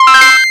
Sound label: win